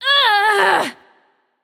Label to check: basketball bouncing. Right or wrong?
Wrong